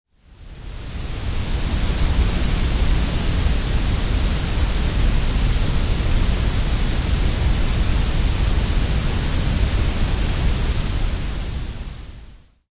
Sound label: noise color mashup